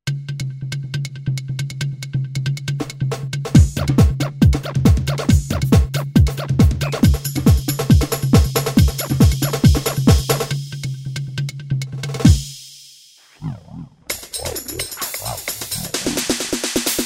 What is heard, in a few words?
dance drums